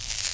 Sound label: portal close